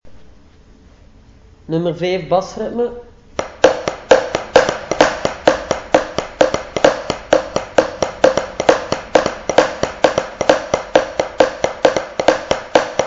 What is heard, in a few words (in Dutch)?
bassritme